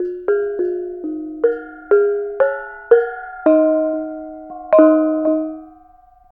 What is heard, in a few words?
BONANG; Spectrasonics; Heart of Asia; BONANG PH